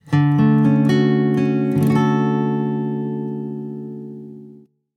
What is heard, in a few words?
Acoustic